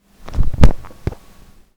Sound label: Kick; SwordAnimsetPro